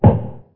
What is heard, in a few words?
dart Hit Board; World Masters Pro; Dart Sounds